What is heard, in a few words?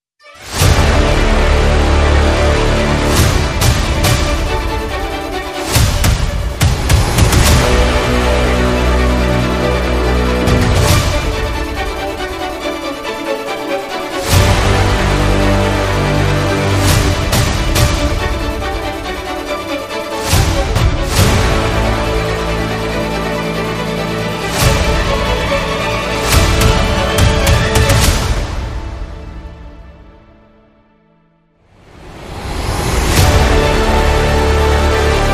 No Choir